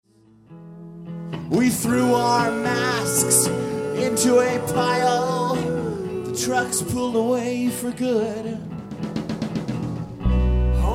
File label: Horses